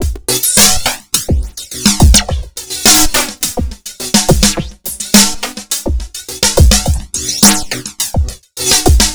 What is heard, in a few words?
xtra Euro gruv